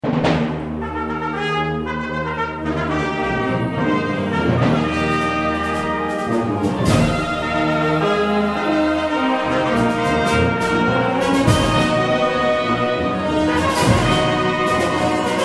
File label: Fanfare